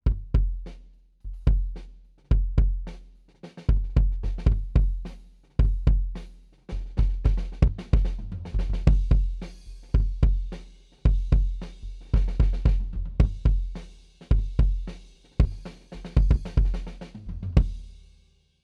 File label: Kick, Head Off 2cm D112 CCR